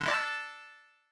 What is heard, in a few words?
Next Screen; CoreConsole; MiSide